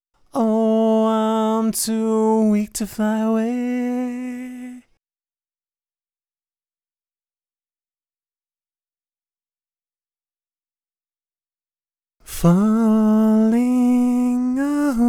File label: falsetto; tampopo rebirth fly away falling away; Pony Fan Music Remix Stems